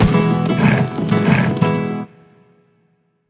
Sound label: bull scatter line; games; Corrida Romance; Wazdan